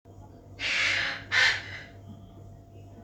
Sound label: Hornbills; silent flyers bird display